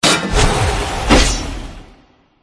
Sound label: Soldier Spear